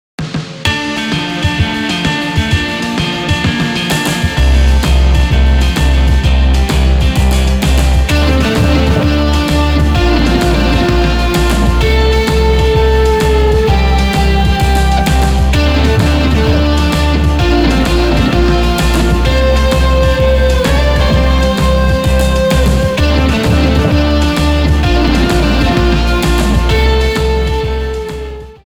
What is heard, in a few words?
Commercial